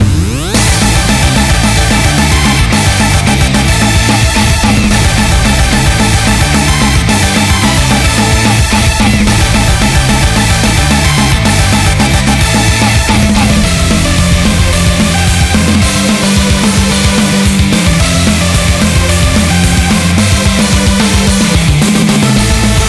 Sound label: BGM Reach